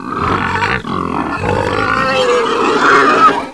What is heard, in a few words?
PIG; mtm2demo; PC Gamer 4.2